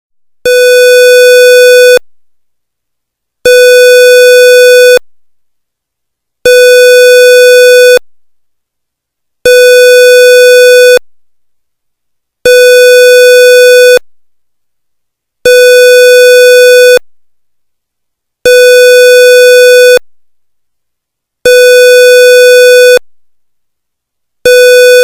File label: 1st Stage Alarm Tone 20 BPM Horn; Slow intermittent beeps; Mohawk Security Services; Safety and Security